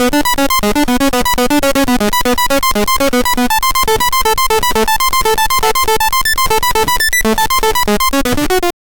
retro evolution, chiptune; retro evolution pokemon

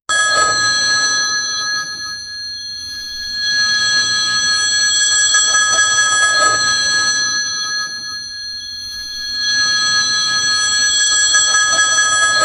intense repetitive ring, telephone

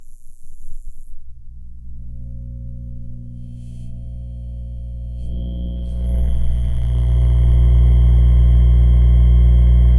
vintage crt screen power; electronics

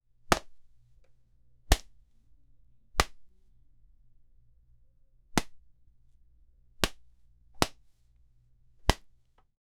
hit cloth

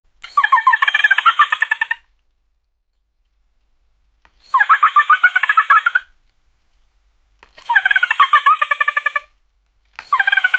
year red koi fish, aquatic

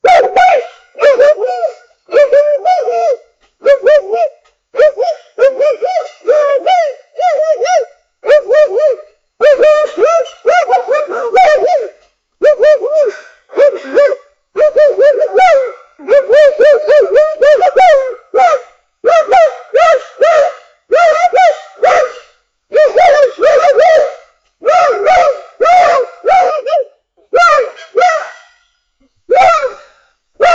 capybara barking; animal communication

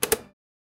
button press of an arcade

